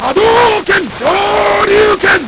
Nintendo Gameboy LSDJ

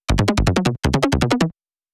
Flappy Psy Bass

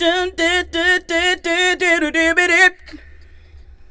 FEM, Hip Hop

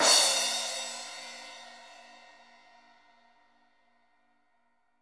CRASH 2 L, DRUMKIT

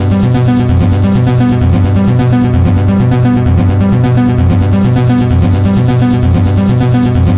NRG Sweep, Trance; Sonic Foundry (Sony Creative Software); Trance NRG VOL-1; Sweeps

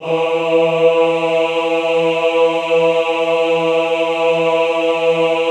AHH E2, Extended Classical Choir; Best Service; AHH MIX

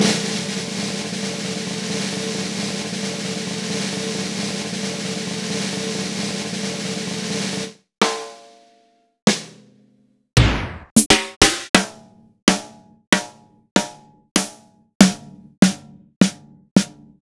Roland BK-5 Snares

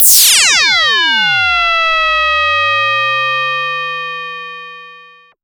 SYNTH FX

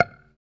F#6 sus, Strat guitar; Spectrasonics; Hans Zimmer Guitars VOL-1; JC-STRAT MTE

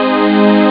roll; Amiga Soundtracker Sample Packs

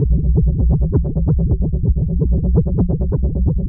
Noise, LFO & Noise

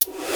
DR-660 DNC, DRUMS PERC; Rubber Chicken Software Sample Bank

